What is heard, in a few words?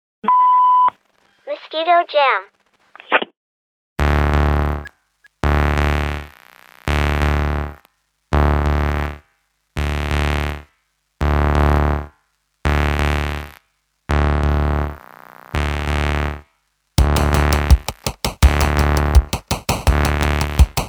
Mosquito Jam